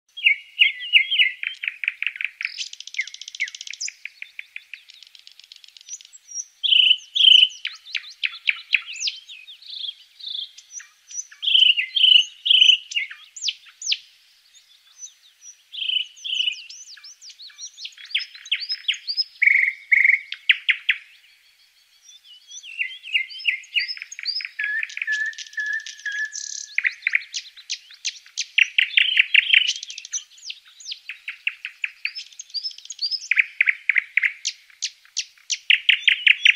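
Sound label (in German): Vogelgezwitscher im Wald bei Morgendämmerung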